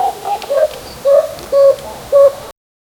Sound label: mother leopard and cub; animal vocalizations